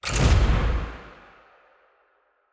ui landing

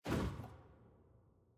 whoosh back

